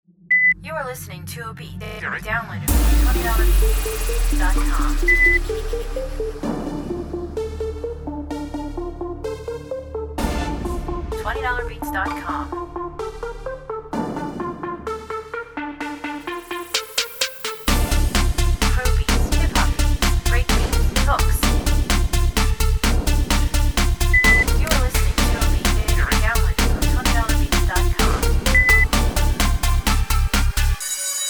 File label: EDM